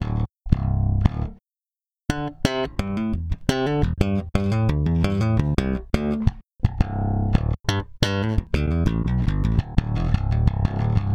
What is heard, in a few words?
Electric Bass